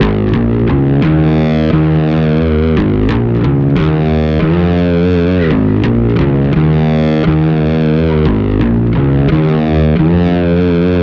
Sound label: Bass; Sonic Foundry (Sony Creative Software); Not Just Another Pretty Bass